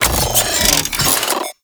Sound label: Turret Deploy; Warframe; Corpus; Corpus Railjack; Crp Admiral Agent